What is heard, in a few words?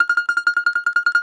BAGLAMA, Plucked Strings; E-MU Producer Series Vol. 7 – Old World Instruments